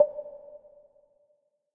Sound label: coin value; rise of maya